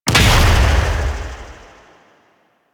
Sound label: Scarab Swarm Burst; Warframe; Mummy